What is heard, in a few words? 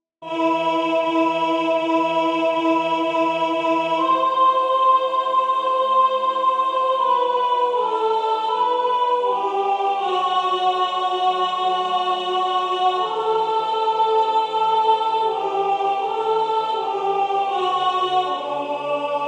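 Night Vision soprano, wisdomchant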